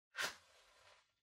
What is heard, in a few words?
Match Far Strike